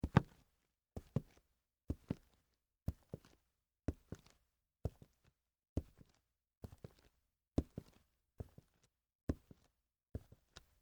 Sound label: Boots Walk Slow, Concrete; Footsteps; Human; BlastWave